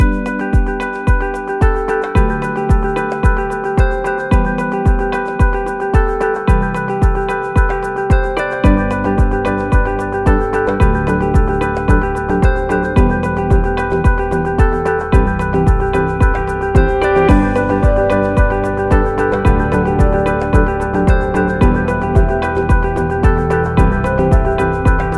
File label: Corporate, corporate music; corporate music vol 6